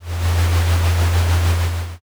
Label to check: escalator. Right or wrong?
Right